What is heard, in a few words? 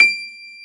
GP, Ultimate Piano Collection; East West - The Ultimate Piano Collection